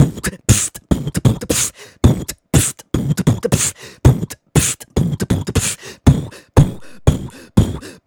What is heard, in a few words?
Beatbox Loops, Human Beatbox